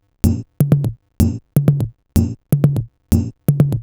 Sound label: Top A# 125bpm, House Drumloops; 90 s Sample CDs; Zero-G House Fabrik Drumloops; ZG Drumloops